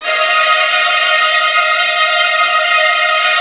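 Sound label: violin trills D#2; ILIO; Kirk Hunter Virtuoso Strings VOL-1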